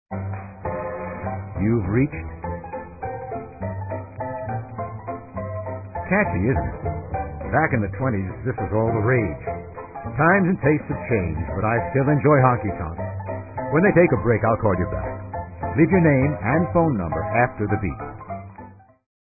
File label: Honky Tonk, Answering Machine Messages